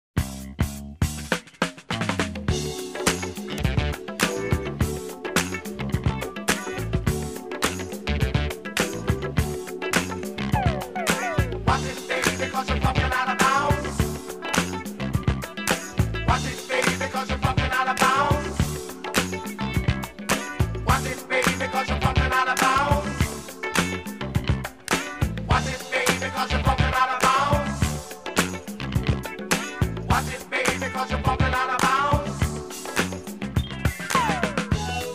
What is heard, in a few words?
Funky Bounce